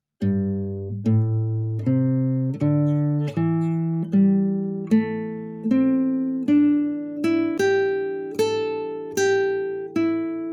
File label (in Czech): Pentatonika